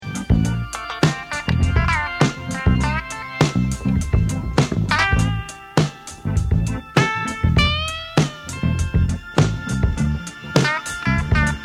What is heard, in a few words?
guitar